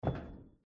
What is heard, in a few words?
step; csgo; eternity; scp; 049